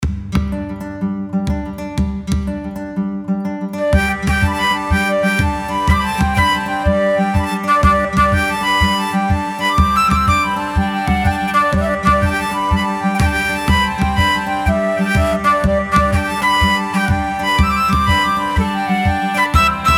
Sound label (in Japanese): 爽やかなアイリッシュ的なBGM; DOVA